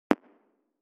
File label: Click